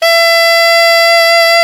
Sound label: SAX ALTO FF, Alto Tube; Roland LCDP07 Super Sax